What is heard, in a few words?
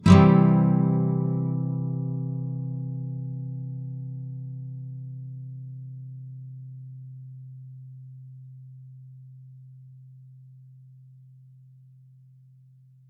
C Full Up